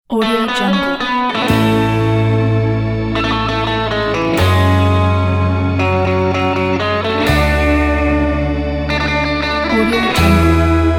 happy surf

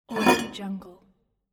toilet tank lid remove

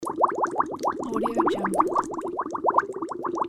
water bubbles

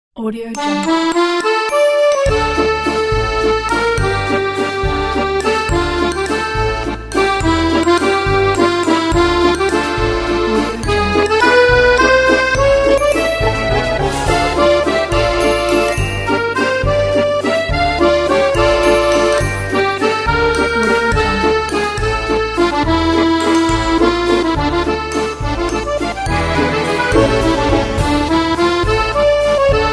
romantic accordion waltz; aidaross

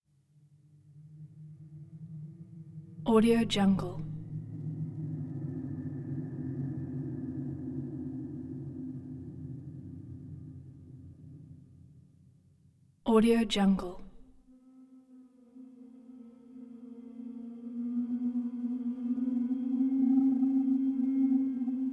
sensory realm howling echoey floating moans

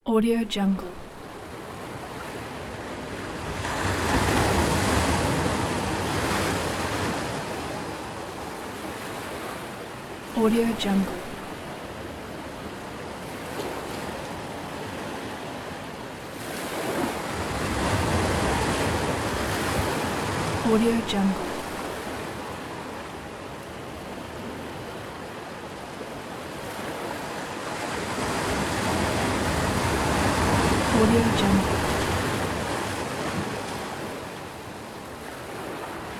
ocean waves

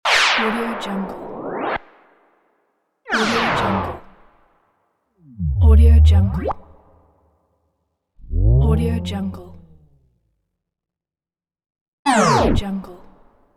game transition

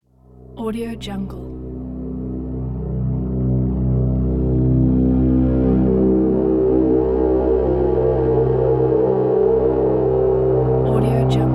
horror drone; anderington

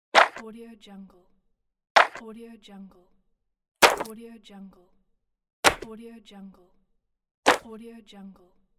paper file drop; audio therapy

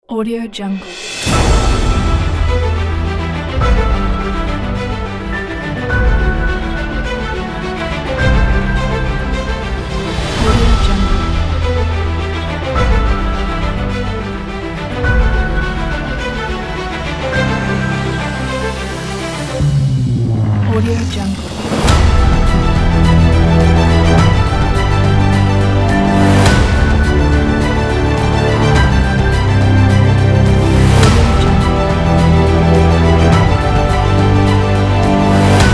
epic

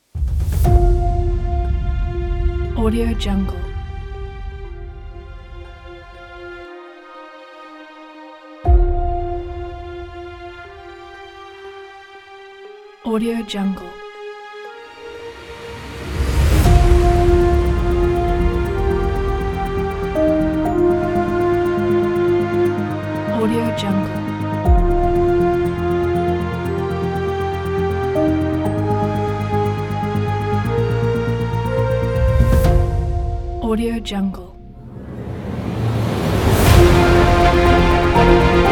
dramatic emotional trailer

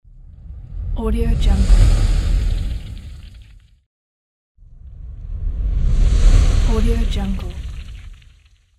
fire whoosh; greenwaysound

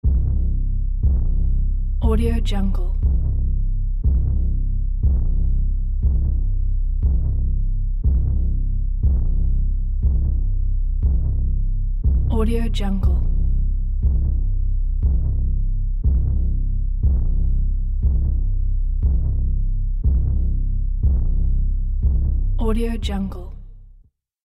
low pulse drone

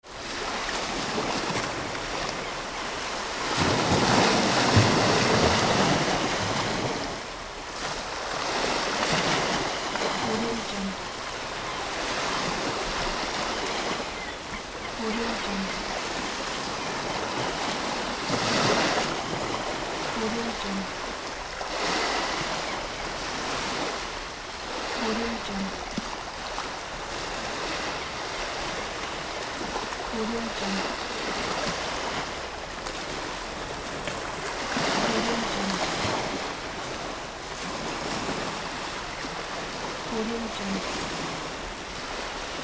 sea

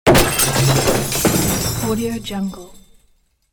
crash

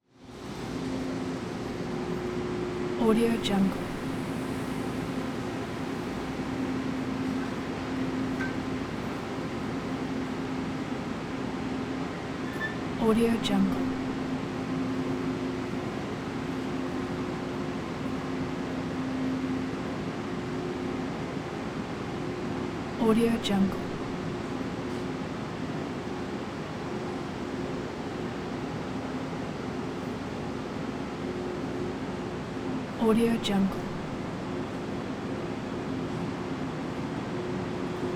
electric train; prosoundfx

